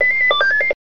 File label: Beepdown